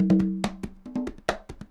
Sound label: congas 140, latin, 140bpm; Sound Effect Library